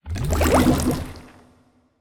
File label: portal; pirates